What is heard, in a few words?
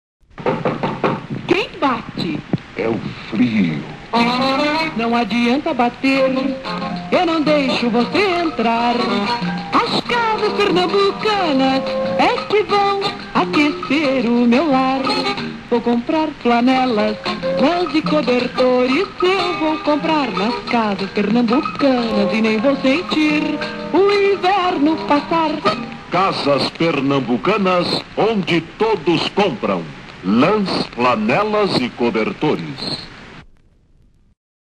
jingle; casas pernambucanas